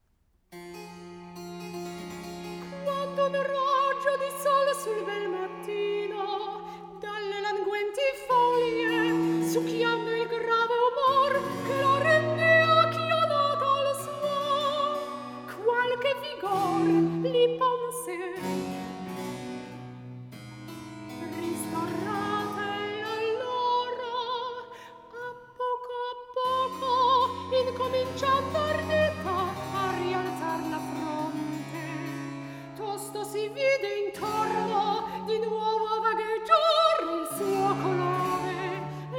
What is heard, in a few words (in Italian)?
recitativo; A. Ariosti; La Rosa